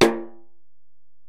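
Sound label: Acoustic Snare, Alesis DM-PRO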